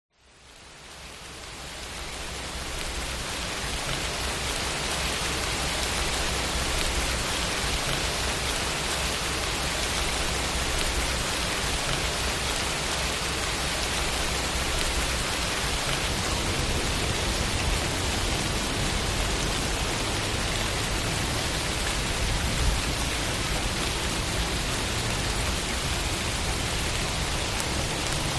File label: nature sounds rain sound for sleeping